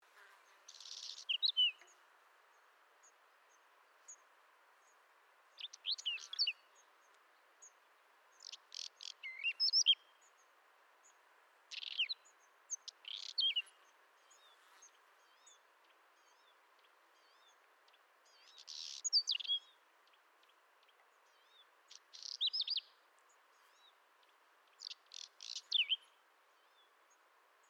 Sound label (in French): Sizerin flammé